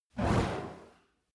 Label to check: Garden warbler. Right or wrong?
Wrong